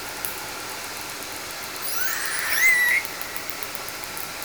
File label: call, L pod, Orca; SRKW; Alwin